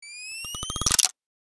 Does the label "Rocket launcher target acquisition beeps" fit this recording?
Yes